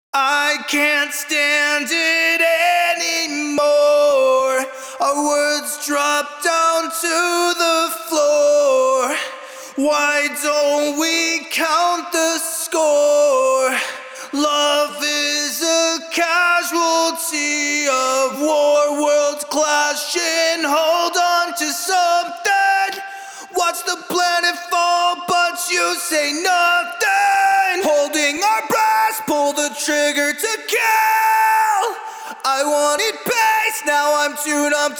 Heavy Vocal Main Vocal Wet, Acapellas, Amin 105bpm; Heavy Vocals 5